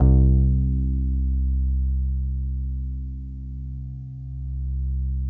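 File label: FM electric piano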